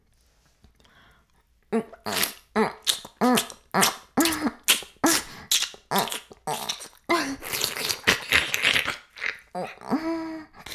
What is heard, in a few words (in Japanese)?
フェラ音声, ASMR; 白くて黒い魔性円環